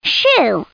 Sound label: shoe